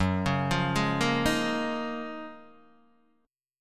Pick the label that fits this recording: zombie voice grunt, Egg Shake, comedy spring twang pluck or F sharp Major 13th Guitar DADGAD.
F sharp Major 13th Guitar DADGAD